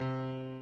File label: onkai do